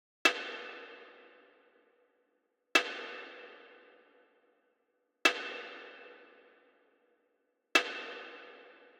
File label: Drum Loop, 96 BPM Percussion; Cymatics; Vibes II Drum Loop Collection; Cavern Drum Loop